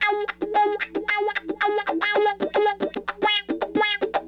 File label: Raw Funk Guitar